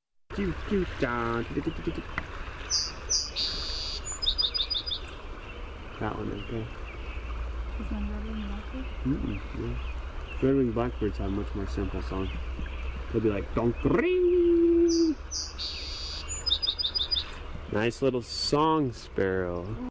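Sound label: Song Sparrow song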